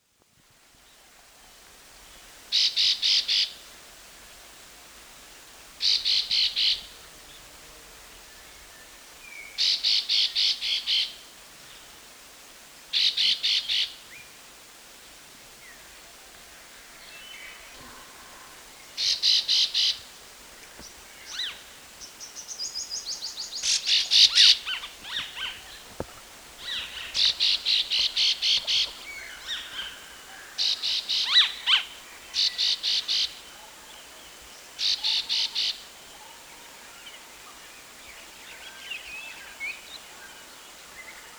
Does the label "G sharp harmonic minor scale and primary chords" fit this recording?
No